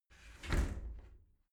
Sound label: shower slide door close hit soft